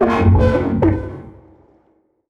strider vox general; creatures

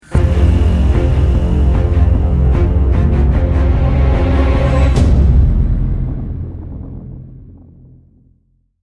lost round, music; CS2; Counter Strike

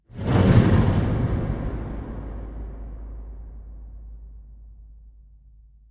ambient fireball flyby; Portal 2

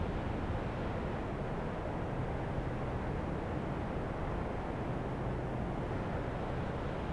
dust wind; ambient wind; csgo; Counter Strike Model Pack

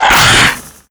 attack, antlion; creatures; vox

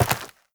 step, footsteps, player, dirt

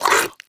breath in, headcrab black; creatures

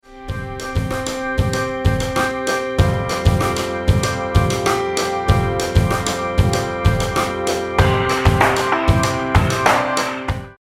round ten sec count, music

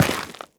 step, footsteps, gravel; player

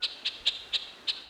crane; ambient; anubis

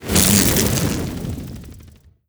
jump, antlion wings; creatures

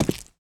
footsteps concrete; player

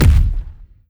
bass soft, zombie blind step; Half Life Alyx; creatures

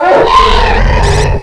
hunter pain; npc; ministrider; Half Life 2; Episode 2

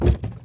cardboard box impact soft; physics

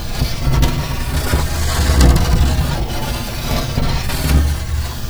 wood crate scrape rough loop; physics

